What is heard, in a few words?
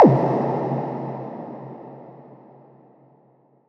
Fx 130, Techno; Techno Sounds 2024